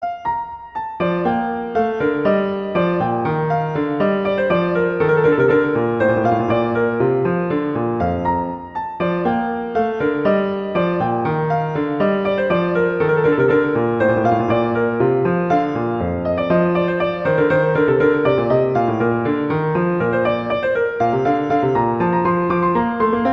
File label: Allegro; Telemann; Canonic; Sonata 1